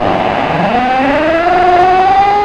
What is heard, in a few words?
bookcase open